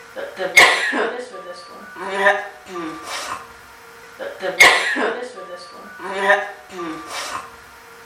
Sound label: odd music